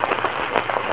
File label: static; NFS